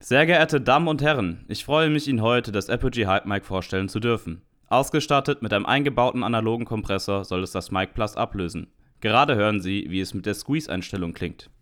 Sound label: Apogee Hype MiC Squeeze